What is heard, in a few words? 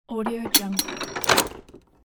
lock